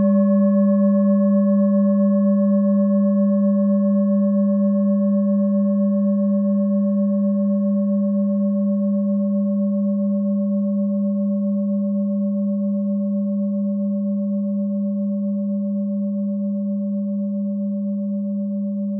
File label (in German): klangschale ladakh